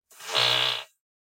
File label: Fart Noise; SoundsCrate